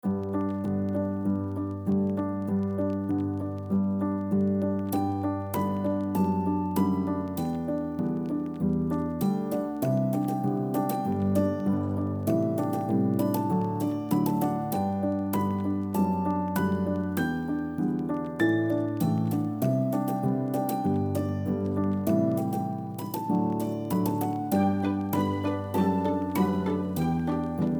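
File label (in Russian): уютная музыка без слов для нового года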